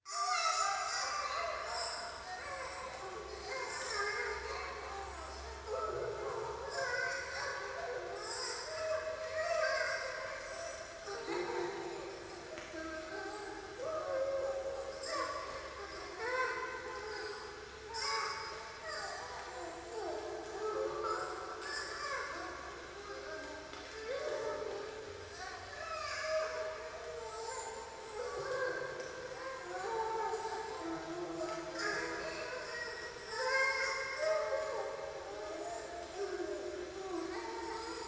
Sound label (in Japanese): 風呂 シャブ喘ぎ 雨, ASMR